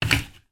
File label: household pump hand manual floor standing down on floor